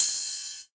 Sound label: verby crash one shot c key